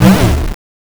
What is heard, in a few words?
Glitch Sound